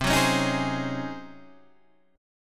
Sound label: Db Major 7th Suspended 2nd Suspended 4th, C, guitar